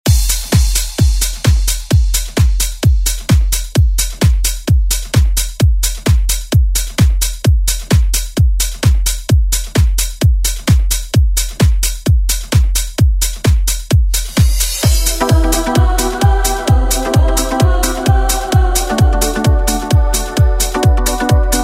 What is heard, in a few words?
90s Re Drum; DJ Mhark